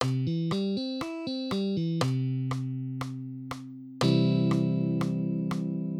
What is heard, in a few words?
Arpeggios on guitar